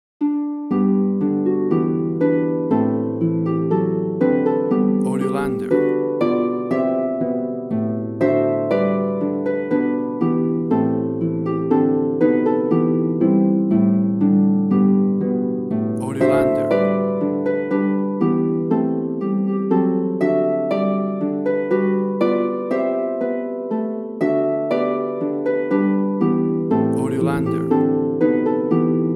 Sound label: Harp; Auld Lang Syne; fast version